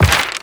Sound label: page turn, book; ui